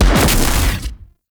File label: Rifle Alt Projectile Embed A; Tenno; Gyre